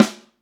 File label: SN, EMU Orbit-3; 300 Drum Machines